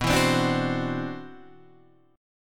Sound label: C-Major Sharp 11th B, guitar